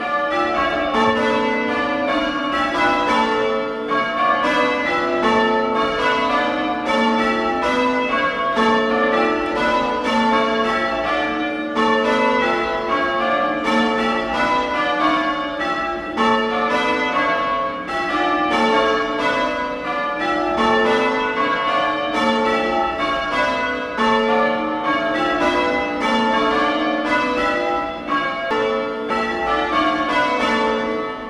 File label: Five bell changes